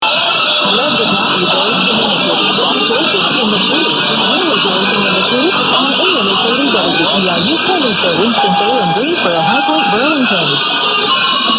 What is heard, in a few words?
lsb then usb; 830; wtru; nc